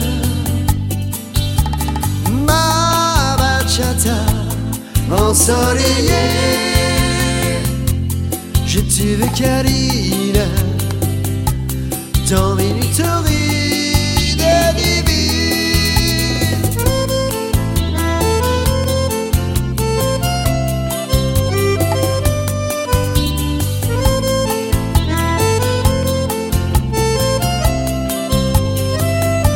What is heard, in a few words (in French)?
bachata